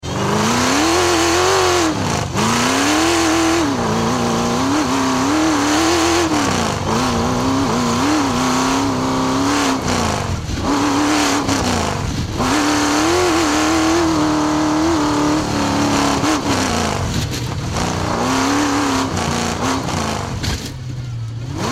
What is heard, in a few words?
Chevy V8 Hot Rod Long Sound Engine Very Fast Driving; Recording from driving around onboard a Chevy Hot Rod. Lots of acceleration, slowing down, engine revv